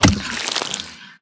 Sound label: bite pull, barnacle creatures; Half Life Alyx